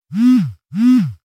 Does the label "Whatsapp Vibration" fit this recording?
Yes